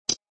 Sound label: click